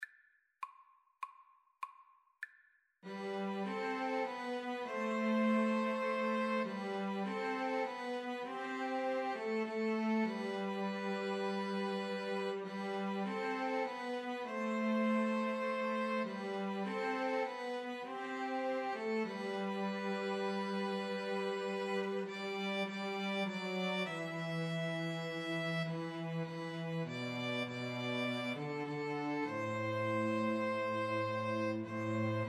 be still my soul, 2 Violins Cello

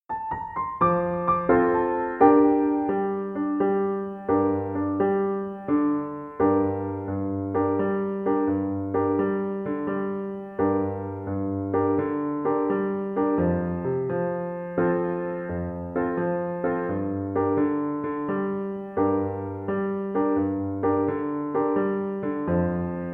rocky road viola

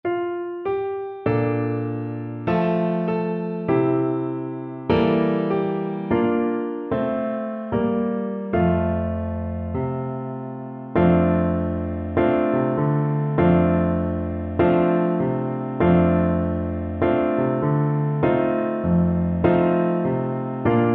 this little light, oboe

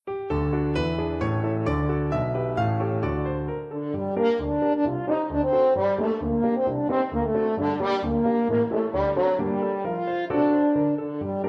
french horn

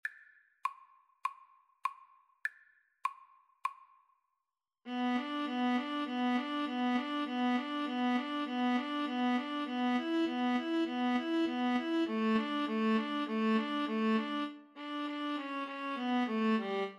Viola Duet

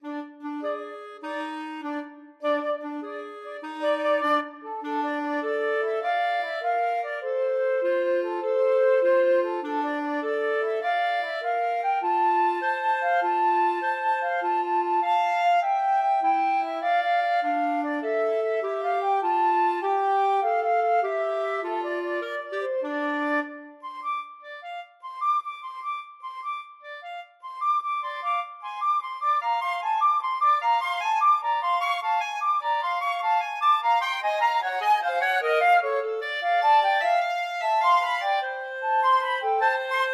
when johnny, flute clarinet duet

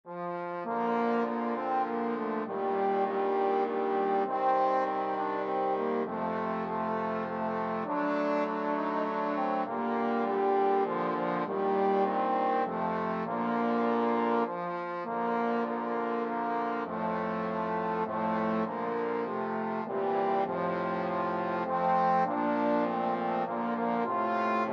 we wish you a merry christmas, trombone quartet

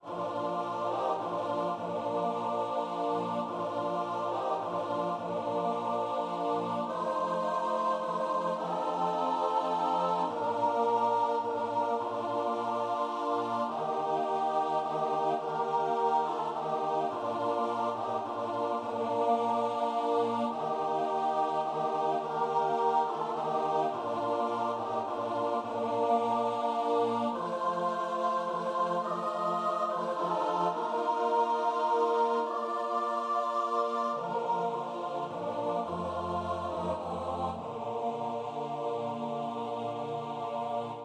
CHOIR

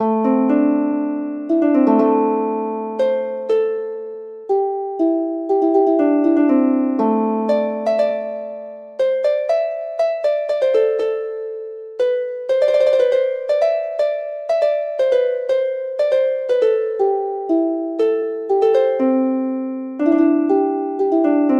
harp

